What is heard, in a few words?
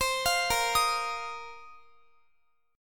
Bb Suspended 2nd Suspended 4th Bb Guitar; arpeggiated; 4E,4A,5D,5G,5C,6F; x,x,22,22,22,22